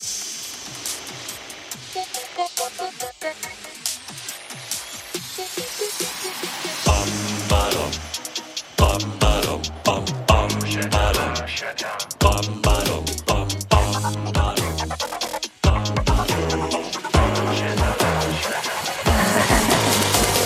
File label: Cold Beat